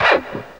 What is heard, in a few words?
SCRAPE, Guitar; East West; Steve Stevens Guitar Samples Collection